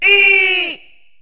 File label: eee, voice; ooh ahh